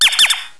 pouet pouet poupée

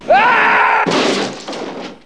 cri

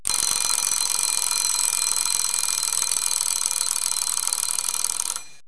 sonnette reveil

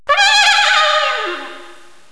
cheval trompette, animaux

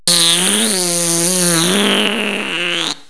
pet, hommes

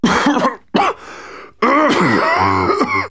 tousse, hommes

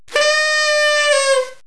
sifflet de carnaval

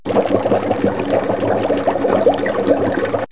vidage lavabo

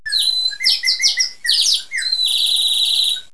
cui cui, animaux

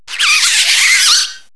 gros bisou, hommes

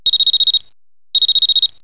reveil auto, horloges

